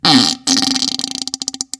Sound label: ultrasonic original, farts